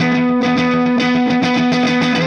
Clinical Distorted Funk Guitar; Sonic Foundry (Sony Creative Software); Indie Rock Anthems; Session 02 - Clinical

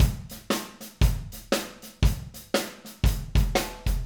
Acoustic Live Drum

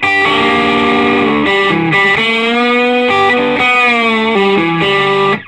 SOLO G, Vintage Blues Guitar

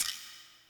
Guiro Short, Yamaha PSR E305

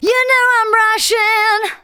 VOCALS SUNG; Techno Trance Essentials

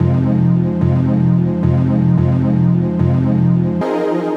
110 Fm, Melodic Loops; M8; Electronisounds

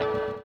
orient, bizarre guitar; Spectrasonics

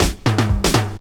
Roll, Drum Rolls; Jungle; Zero-G Jungle Warfare 1, 2 & 3; 90 s Sample CDs

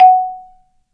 Marimba; Roland LCDP11; Africa VOL-1; Af.Marimbas

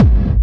hard bass drumz

